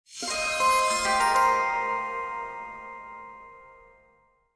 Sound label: Quest Received; B Path of the Teal Lotus